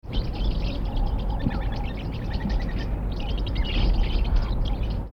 agapornis lilianae; psittacidae; calls

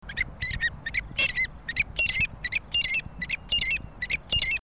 scleroptila levaillantoides, birds calls; phasianidae

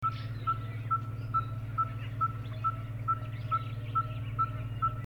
pogoniulus pusillus, birds calls; lybiidae

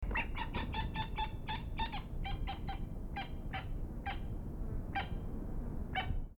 pternistis natalensis (alarm); phasianidae; calls

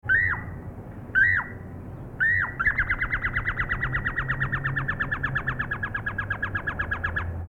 clamator levaillantii, birds calls; cuculidae

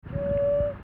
sarothrura affinis, birds calls; rallidae

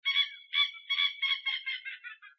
peliperdix coqui, birds calls; phasianidae

